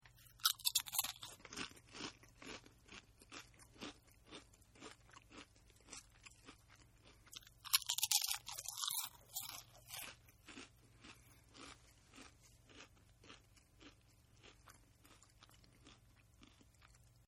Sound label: Chomp Chips n Chew; Eating; Sound Effects:Human; Partners In Rhyme